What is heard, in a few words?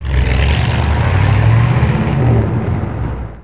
facel drive